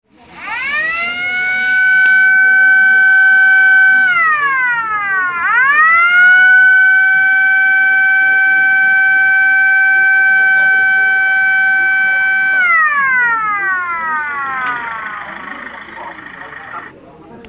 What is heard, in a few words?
Ambulance Siren